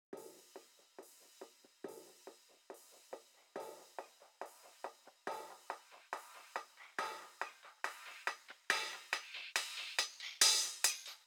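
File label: 140 BPM Intro Break Drums hi-hat; Vengeance - Essential Clubsounds Vol.5; VEC5 140 BPM Vengeance Intro Kit Root E; Drums & Perc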